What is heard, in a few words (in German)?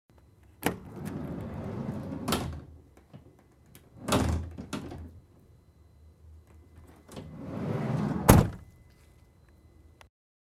Schiebetuer Lieferwagen